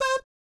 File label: BAP C5, Jazz; ILIO; Vocal Planet; Jazz & FX; JAZZCOMBO